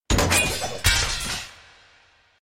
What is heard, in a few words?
Weapon Rack; Diablo 3